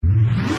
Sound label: speed up; Mr. Bean - Bean Skidding